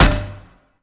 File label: sword